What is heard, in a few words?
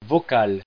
vocal